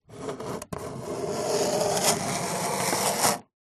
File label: wood plank spike scratch; foley; zapsplat